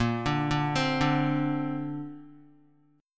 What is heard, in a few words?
Bb Major Add 9th, Guitar; arpeggiated; JGuitar